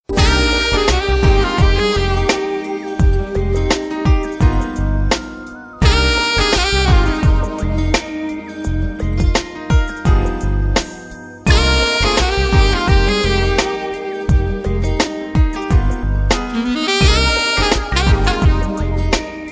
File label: smooth saxophone; Jazz